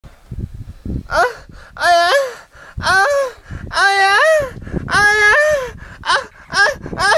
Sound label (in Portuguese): aiai aiai mulher, som de mulher gemendo aiaiai